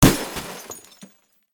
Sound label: Crash Television